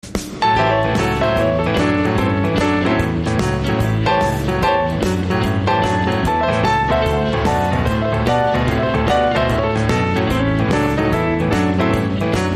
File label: Midnight Shuffle